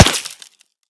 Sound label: soil; Escape from Tarkov